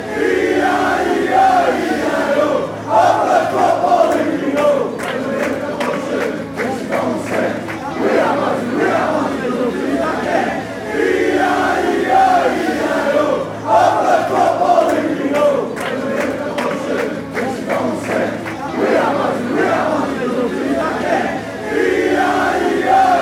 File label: e i e i e i o, fanchants